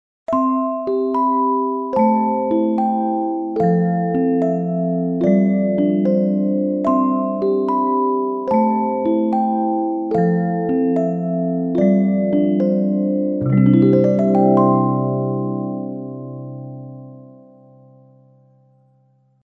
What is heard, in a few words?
chime